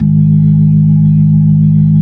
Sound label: B-3, Jazz